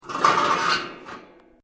Metal plates scrape; Material components to create more complex sounds; Materials

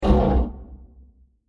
Scratch Impact; Blasts; Impacts; Crafted Signals

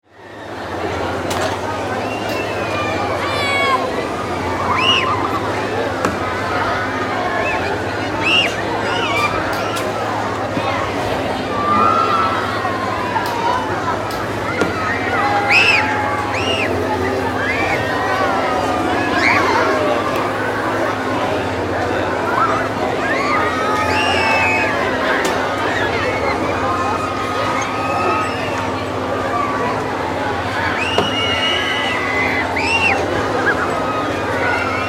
Ambience, Carnival, Fair; 5.1

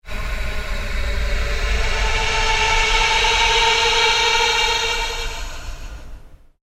PULSE LONG SWEEP

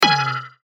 button high tech; Multimedia

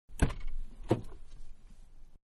Mid Size Car Door Open Exterior; Vehicles; Car Sound Effects

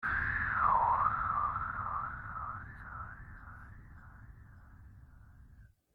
SURGE FADE, Various sweeps, pulses and drones; Rises and Dives

